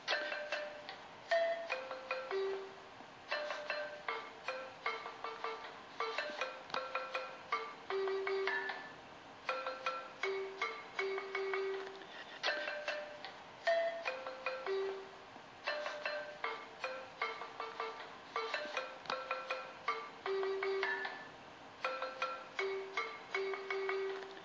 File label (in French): Balafon Melodie